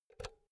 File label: power cord insert hard; objekte; elektronik